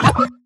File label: Dodge